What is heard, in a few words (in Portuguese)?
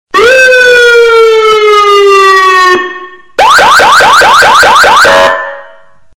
carro policial encostando sirene